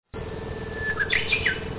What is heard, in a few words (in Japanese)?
uguisu